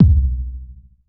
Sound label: Sub, drum; Subs; Drum Bits; layering